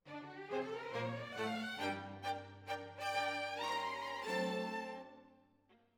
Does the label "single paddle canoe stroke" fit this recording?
No